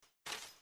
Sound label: trash sound; Trek